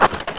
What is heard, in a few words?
MORTAR